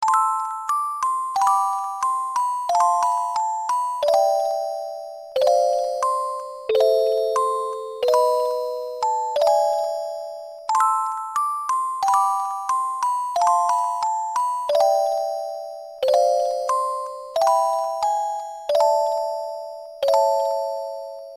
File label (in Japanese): オルゴール風